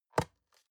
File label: box small open soft; industrie wirtschaft; bueroarbeit